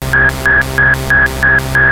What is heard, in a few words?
MG1 loop, Moog; OLD SYNTHS; Rubber Chicken Software Sample Bank